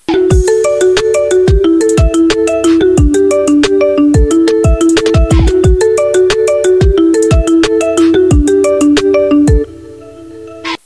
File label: drip car